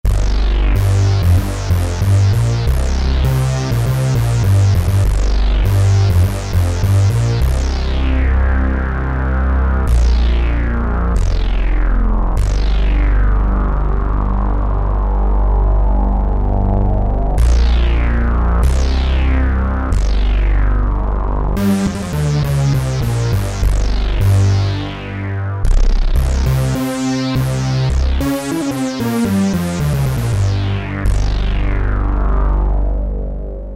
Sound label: Mondo Bass, Kurzweil K2000; Kurzweil K2000 V3